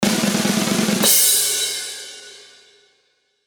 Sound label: drumroll short